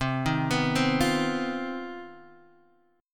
C Minor Major 7th Double Flat 5th chord, Guitar Half Step Down